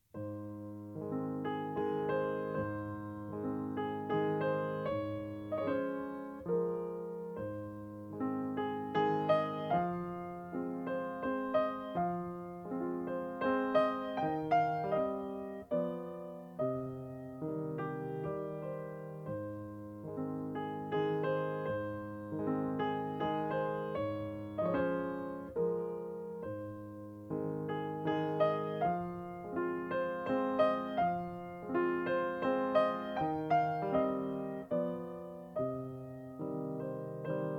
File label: waltz